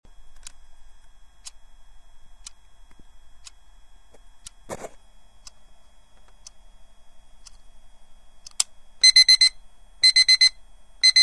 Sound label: braille alarm clock